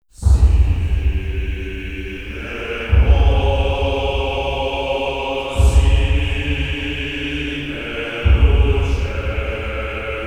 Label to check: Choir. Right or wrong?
Right